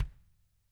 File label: Tama kick; ILIO; Double Platinum Drums 2